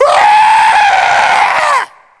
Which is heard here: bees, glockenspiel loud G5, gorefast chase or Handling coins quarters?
gorefast chase